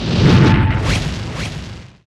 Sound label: chain rocket